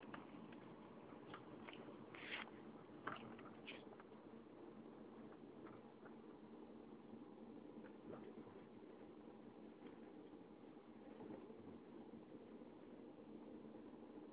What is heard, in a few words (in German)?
klappern